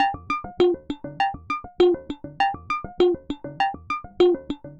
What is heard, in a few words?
synth 100 hard bloops; Transmission-X